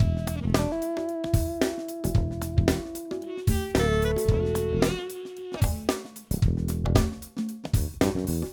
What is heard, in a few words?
Jazz